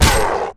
hit; Akeron

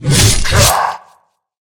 attack; Cato

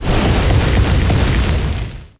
Magma Magician attack; Ignis Volcano

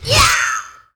devilfairy attack; Akeron

